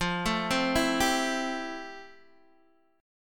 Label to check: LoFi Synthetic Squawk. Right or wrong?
Wrong